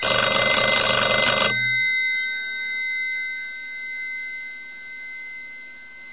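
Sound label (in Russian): так звонит старый домашний дисковый телефон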